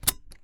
Seatbelt In

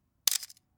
Canon Ae 1 Shutter

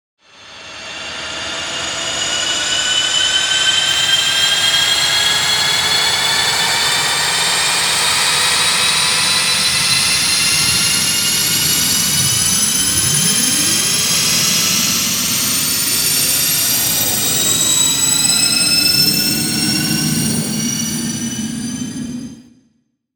Like A Jet Engine Revving Up

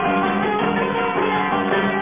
Radio Oman Loop

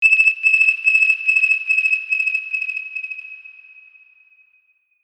Sonar Plus Echo

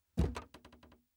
Door Impact

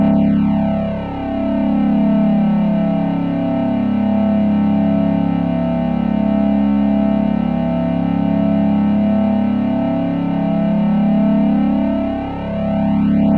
Buzz Room Looped

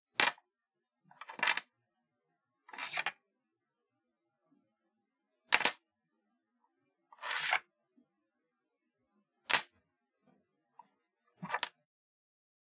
Watch Pickup Laminate Wood

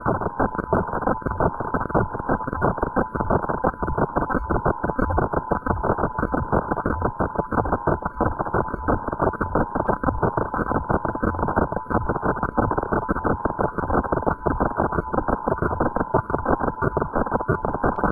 Alien Data Transmission

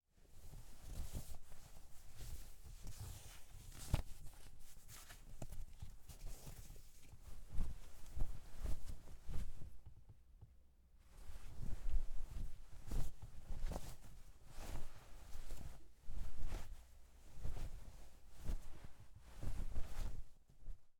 Clothing Rustling Taking Shirt Off

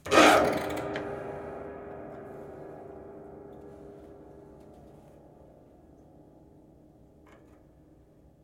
Scrape Stab

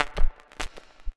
Beep verb

